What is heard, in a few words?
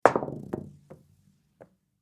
Pool Bank Shot, Pool Bank Shot No Balls; Billiards; SPORTS; Pool (Sound Effects:Sports)